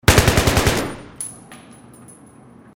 ak 74 burst inside

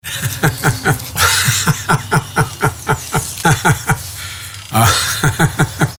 laughing; biden

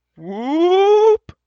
wobble woop

